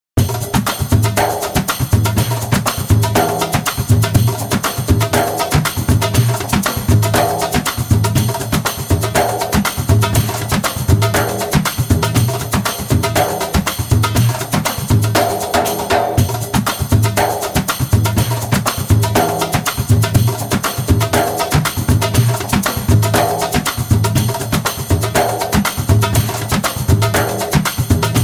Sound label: Spicy Bendir fast spoken; Djembolale - Grooves and Moves